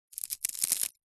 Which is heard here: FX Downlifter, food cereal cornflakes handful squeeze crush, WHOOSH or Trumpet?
food cereal cornflakes handful squeeze crush